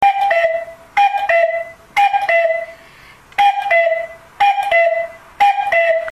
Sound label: cuckoo call